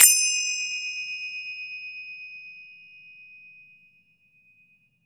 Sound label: FINGER CYM; FINGER CYMBAL 2